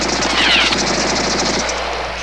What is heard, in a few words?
GUN; CZ